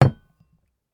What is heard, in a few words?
Put down the wine glass